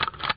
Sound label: laptop close